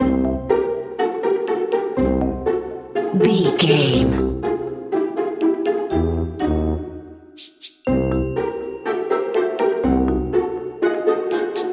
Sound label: sneaky lurking